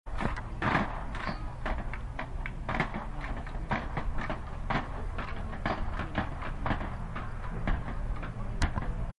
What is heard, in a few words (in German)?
impro-instrumente gross